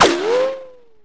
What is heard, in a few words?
ricochet, weapons; Shadow Man